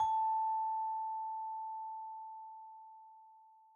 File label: Scale; Second Scale8; tintin reporter cigars of the pharaoh